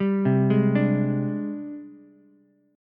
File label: G sharp Major Sharp 11th, Ukulele C6; G#M#11 arpeggiated; 0,0,4,5; JGuitar